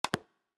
vector lock

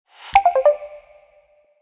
report info, Samsung phone system; SGH-T369 Linx; warning alert dialogue

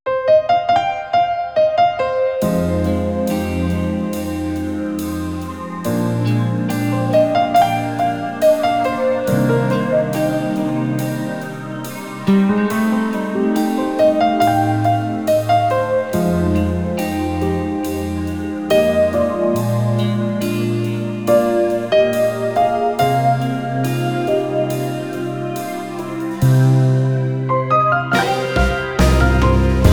House of Sand Alarm; PlusFourSix; Alicia; Lars Edvall

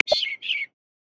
Large Ground-Finch, Galapagos; Xiaomi Redmi-8A

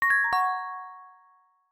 swingdown start prism; LG Wing 5G SKT

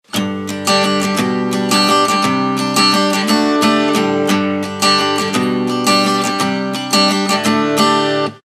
On The East Coast, alarms; Meizu MX4

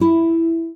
Classic Guitar, notifications; Oppo Find X5

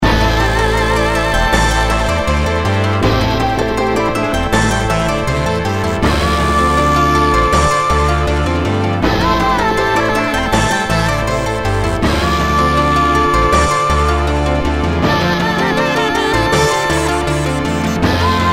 Fujian Love, ringtones, China; World

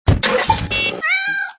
Wind Chime; Motorola E398; Hijacked by MTV

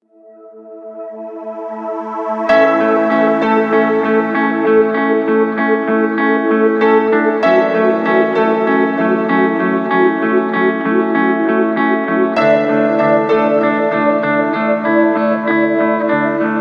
Soft Long Wake, ringtones; Yota; Yotaphone-2

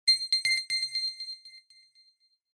message, notifications; K-Touch; Nibiru-H1

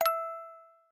Music Box, notifications; OnePlus 11

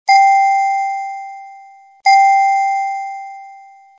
Double Beep; Samsung SCH-U310 Knack

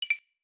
Wood close; Nokia; Audio themes

